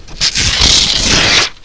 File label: ripping, house